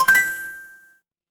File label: ui chime